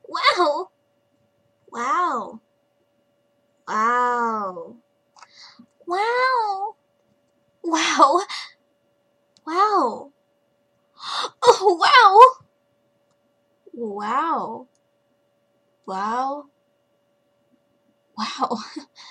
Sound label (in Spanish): wow sonido efecto